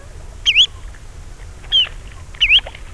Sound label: Tringa erythropus; pticy; kulik